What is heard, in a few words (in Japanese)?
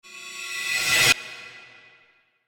魔法 マジカル; 魔王魂